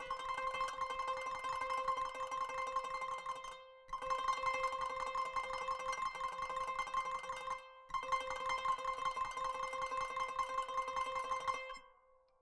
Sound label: kanun